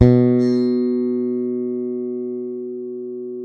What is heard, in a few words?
SUSTAIN, Bass